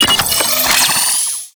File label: Orbital Strike Warning Target; Warframe; Veilbreaker; Venkra